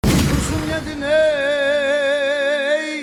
trap remix

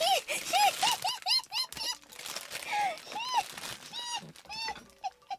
polka

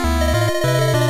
riot bell

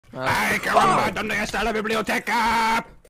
techno scream

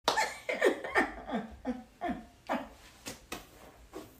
hen hen hen

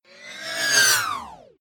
shield

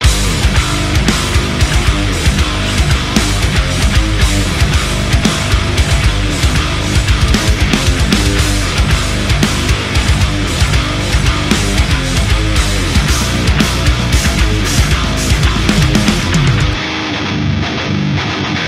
ringetone

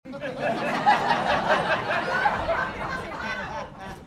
bats laugh

